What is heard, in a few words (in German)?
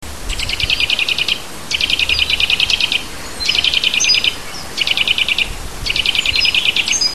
kleiber; vogel; hecke; biologie